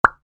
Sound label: Clean notification info pop; shorts sound effects